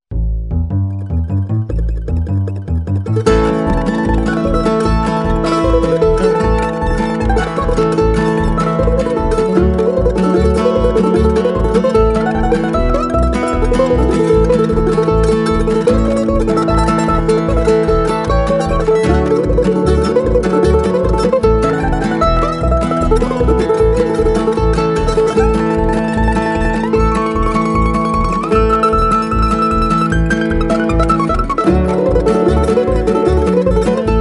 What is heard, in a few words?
tennesse blues, mandoline